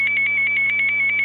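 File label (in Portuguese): urna eletrônica confirma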